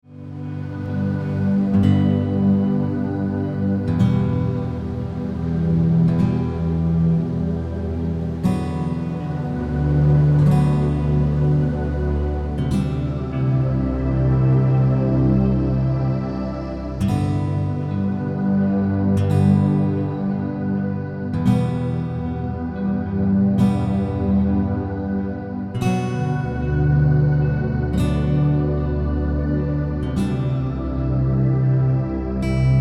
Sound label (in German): Entspannungsmusik